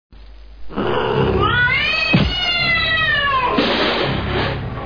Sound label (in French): chat